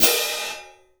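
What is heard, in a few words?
cymbal, Orchestral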